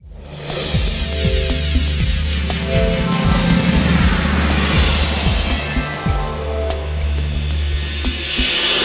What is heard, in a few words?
Puzzle Clear; Pac Attack; PS1